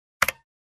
Keyboard key press

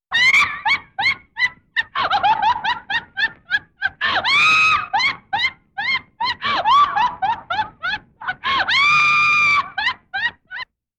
Woman Laughing Hard Lo-Fi

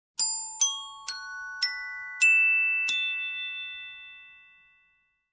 Dreamy glockenspiel melody

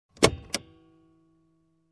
Buckling Up

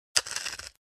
Pepper Shaker

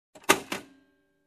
Printer compartment opening

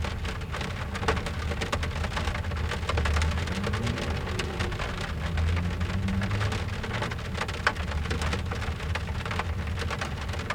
Rain on Cars windshield interior

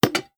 Big Button press

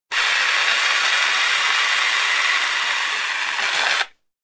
Small Fire Extinguisher

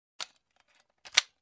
Reloading weapon slowly

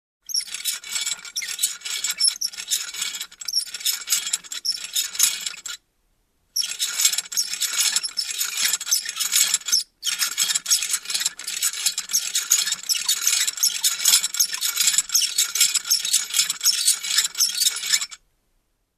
Loose metal creak

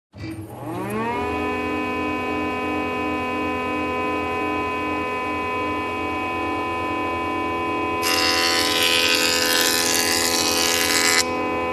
Construction

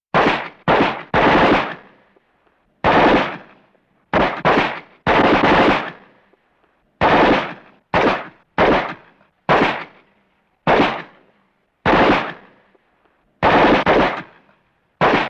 Semi-Automatic Gunshots

